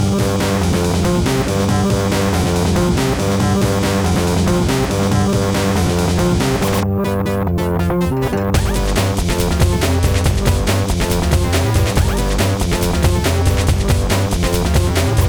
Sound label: level Win; Zombies Corporate Lifestyle Simulator soundtrack